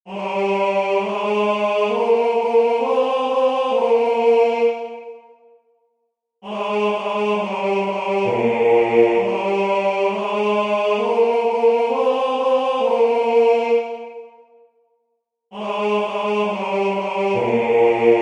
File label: Ubi Caritas Bas; Durufle